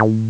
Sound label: bass synth